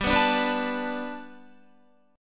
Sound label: G Major Add 9th, Guitar; Gadd9; strummed; 14,14,14,16,14,17